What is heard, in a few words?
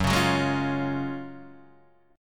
F sharp Major 7th Suspended 2nd, F sharp, down, Guitar, Half Step Down